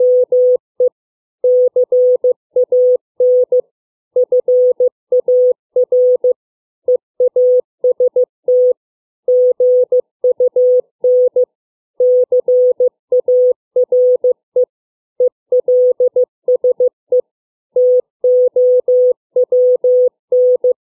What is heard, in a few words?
CW range of word lengths